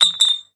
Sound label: glass